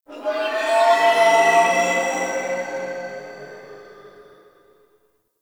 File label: Gas Dist Energy One Off; Warframe; Corpus Gas City; Ambience